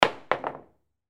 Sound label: billiards jump shot